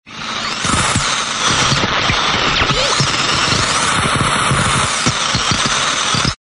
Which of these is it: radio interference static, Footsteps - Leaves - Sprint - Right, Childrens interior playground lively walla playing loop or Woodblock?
radio interference static